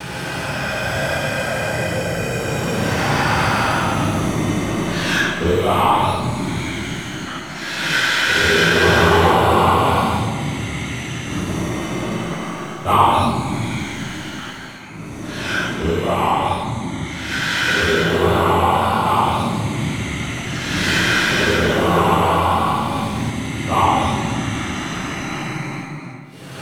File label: vort healing loop; ambient; Half Life 2; Episode 2; gman